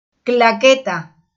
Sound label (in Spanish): claqueta